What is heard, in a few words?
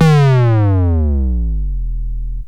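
kick b2 down, yamaha rm1x synth bass and lead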